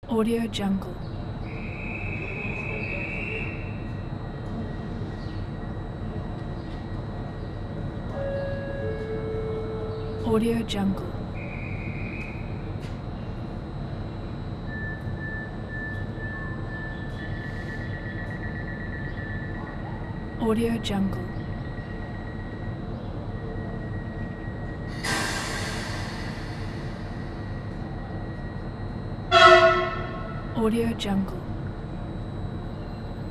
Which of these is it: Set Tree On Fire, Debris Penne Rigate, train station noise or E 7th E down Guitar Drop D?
train station noise